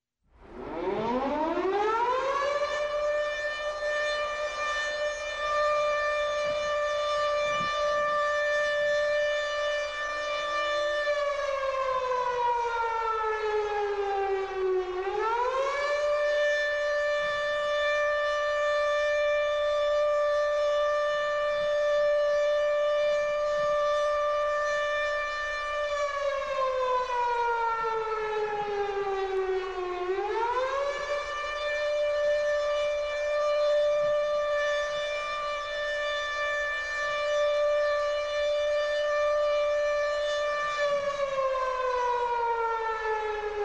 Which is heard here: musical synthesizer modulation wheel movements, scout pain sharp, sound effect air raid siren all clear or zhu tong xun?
sound effect air raid siren all clear